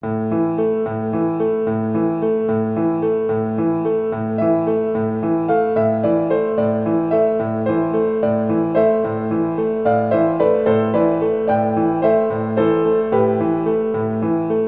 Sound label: piano arrange